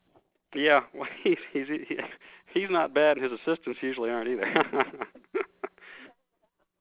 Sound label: laughter word; switchboard review